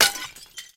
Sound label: Ceramic Destroyed